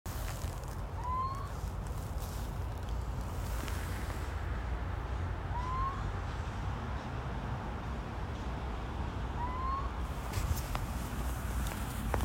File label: little owl